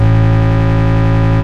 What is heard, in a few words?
Love Bass - 11B; Jungle; Blu Mar Ten; Jungle - 1989 to 1999